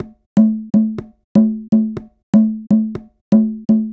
Percussion 122bpm Conga

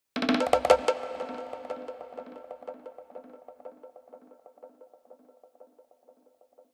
Drum Fills

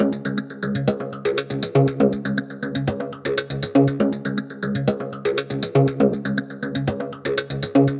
Arp 120bpm

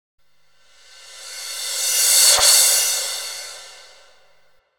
Cymbal Loop

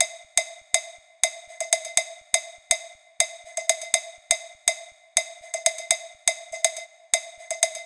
Cowbell Loop

